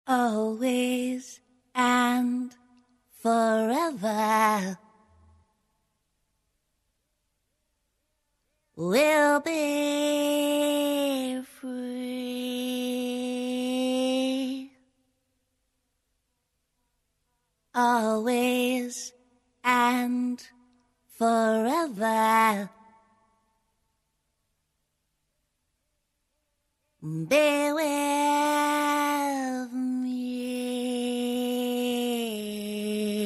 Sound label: voice; Sad